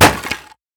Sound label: wood solid impact bullet; physics